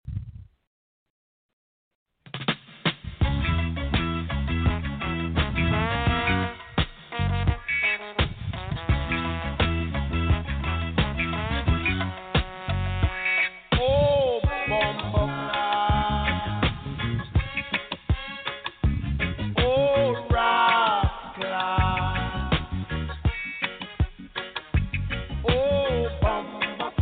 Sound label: reggae music saturday night mix